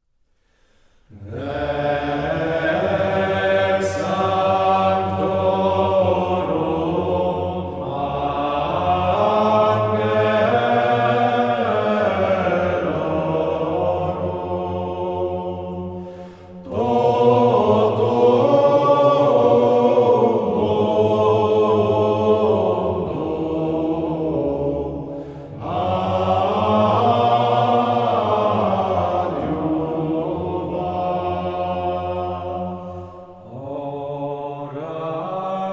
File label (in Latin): Hymnus; Rex Sanctorum